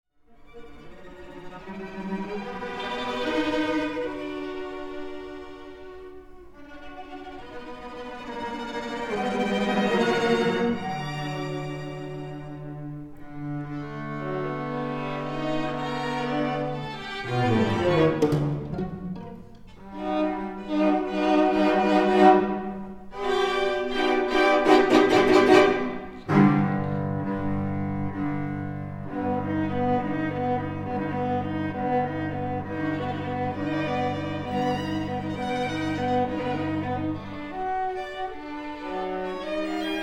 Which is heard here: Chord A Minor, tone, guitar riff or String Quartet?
String Quartet